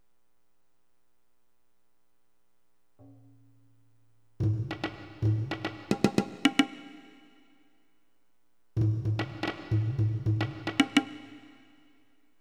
tomtom pattern 110

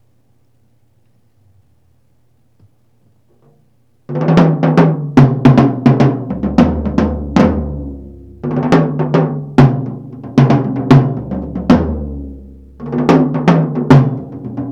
tom tom pattern 110, acoustic

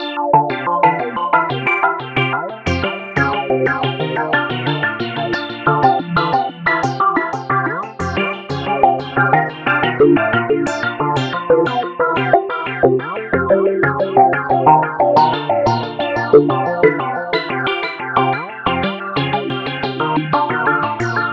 acid wall 90

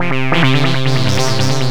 140 loop

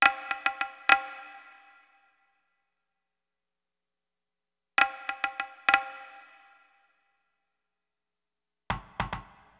100 tabla

